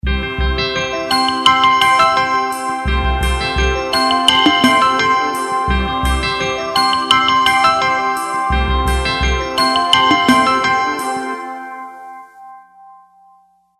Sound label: jingle; Samsung; SGH-T919 Behold